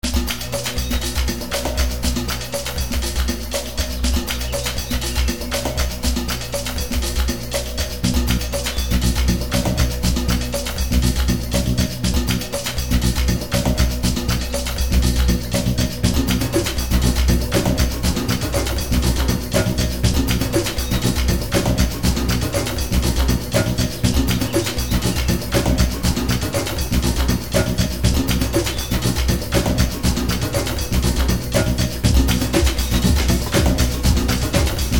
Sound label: Dream Waterfalls Medium; Serious Sam